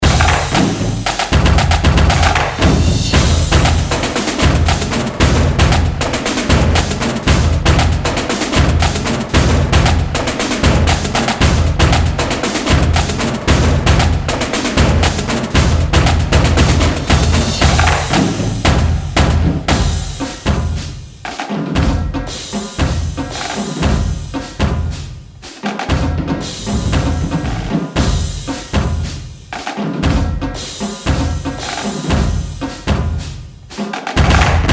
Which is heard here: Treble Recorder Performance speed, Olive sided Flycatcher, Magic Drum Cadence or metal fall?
Magic Drum Cadence